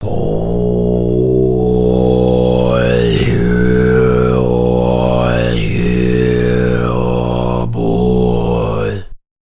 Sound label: Low Chant Dry